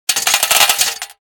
Casino game slot machine coins dropping into pay out cup